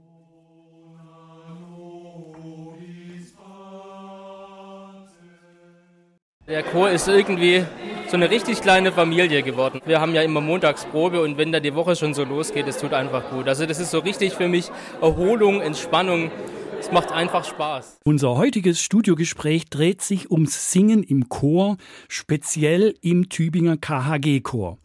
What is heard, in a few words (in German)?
Chor